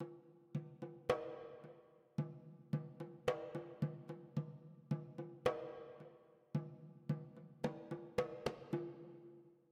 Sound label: Drums Mid; Frozen Flame; windows